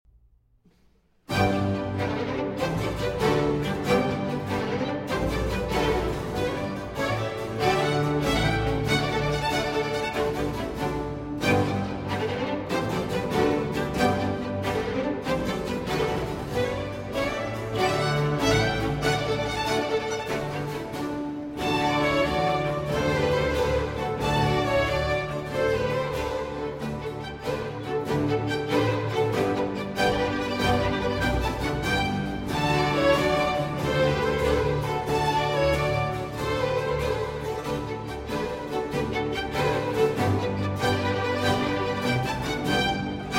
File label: Concerto for Strings and Continuo in G